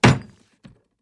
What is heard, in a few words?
Body Impact head hit